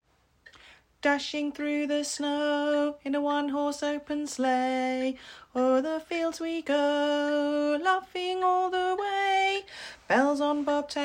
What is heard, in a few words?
ALTO, choir; Jingle Bells; Christmas; church